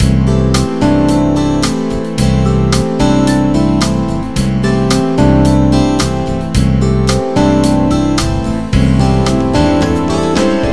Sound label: 8Beat, MIDI